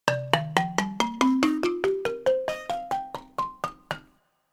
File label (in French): son gamme penta